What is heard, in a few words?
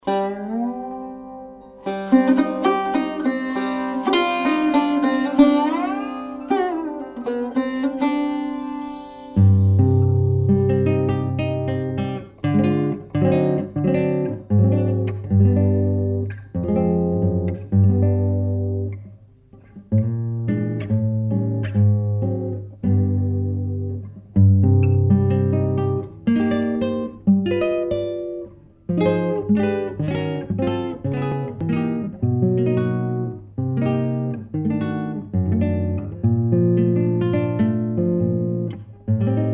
indian tango